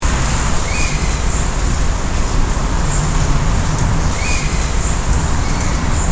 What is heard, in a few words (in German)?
wespenbussard